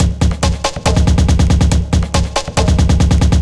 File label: ind 140 kit